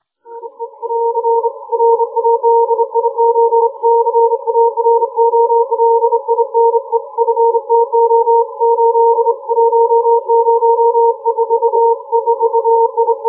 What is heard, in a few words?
fast hand key, Fast Morse Hand Sending; speed of 24 wpm; locho sent with a speed of 24 wpm